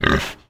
Pig

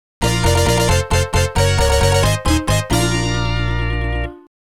Winning Fanfare; Ordyne; Dream Co., Ltd.

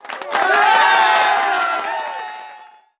Music Frontend

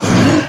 Monster Effort Big; Monster George

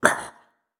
Damage

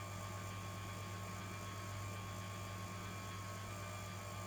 El-Hum-Dirty Loop

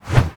Projectile Throw; Mantis Heavy Flyer; Hollow Knight

